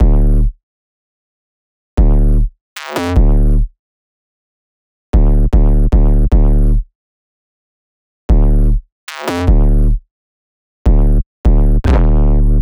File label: loop bass 152; ceres; Seismic Loops; Bonus Bass; SEISMIC2